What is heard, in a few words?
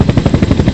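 helicopter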